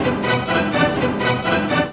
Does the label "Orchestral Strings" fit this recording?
Yes